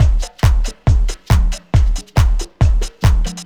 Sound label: Full Loop